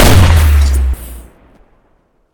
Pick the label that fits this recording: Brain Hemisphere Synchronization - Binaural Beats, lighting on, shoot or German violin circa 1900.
shoot